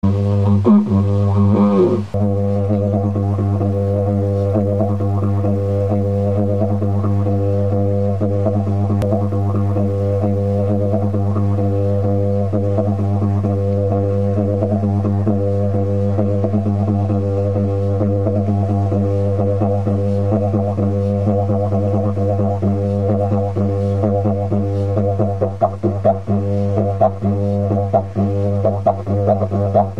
didgeridoo

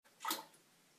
plop

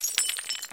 Broken glass

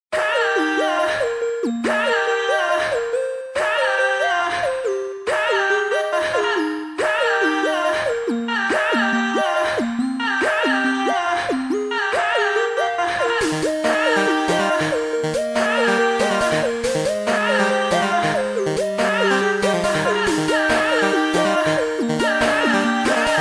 Remix

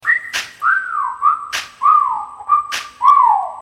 Whistle

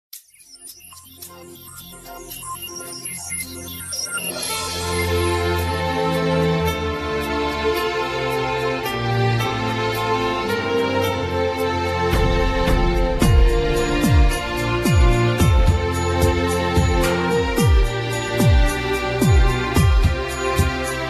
Soft Tunes

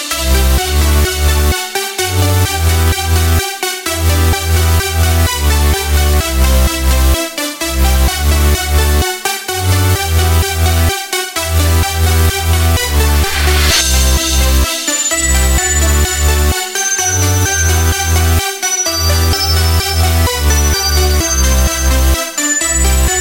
Digital Music

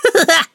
Giggle

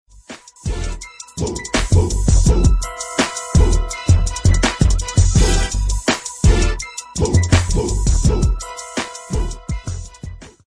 Beat

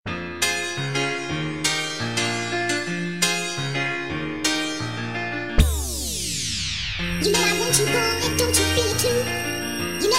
Dream Frequency

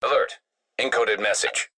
Encoded Message

